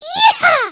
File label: excited, emotes